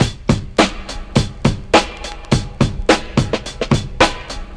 105 bpm drum loop sample a sharp key, Free drum loop - kick tuned to the A# note